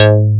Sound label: RULER BASS